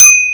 Ding; X4200 vert

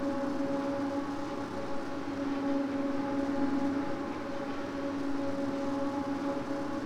Traction blower, Train; OpenBVE; Cl313 Silverlink

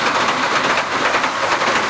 Run, Train; OpenBVE

